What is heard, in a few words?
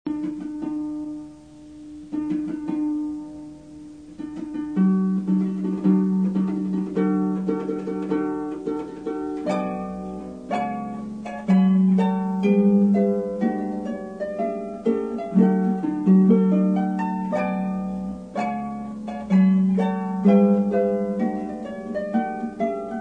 wedding march; mendelssohn